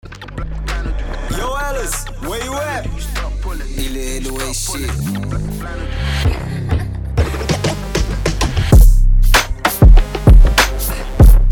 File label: Drum kit; LS Moncler